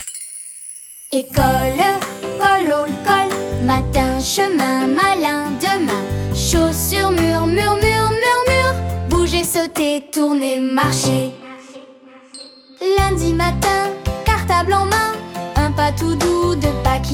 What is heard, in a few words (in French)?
comptine rythmique, lundi en baskets